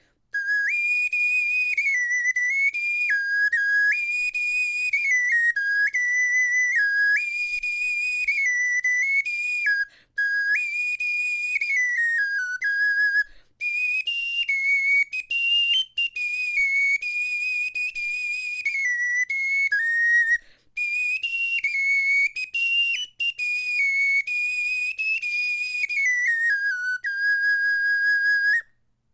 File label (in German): melodie